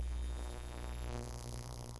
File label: a tube light buzzing; lighting